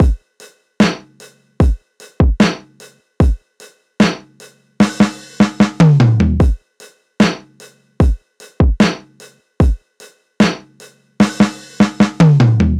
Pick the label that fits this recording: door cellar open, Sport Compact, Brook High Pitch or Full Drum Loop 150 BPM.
Full Drum Loop 150 BPM